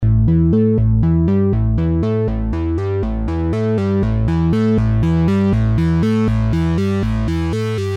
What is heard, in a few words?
arturia cs 80 bass